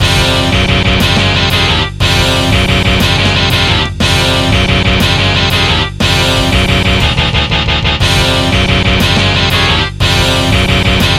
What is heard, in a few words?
Heavy Metal; Cookie Monster